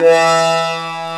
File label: Whhhhh; DS; NEWSMB